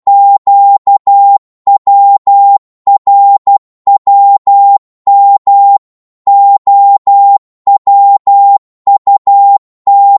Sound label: 12wpm, Morse